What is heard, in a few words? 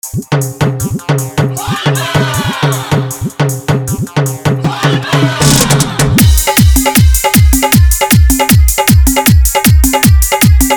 bolbam style fully dhollki bass rod show gard dance mix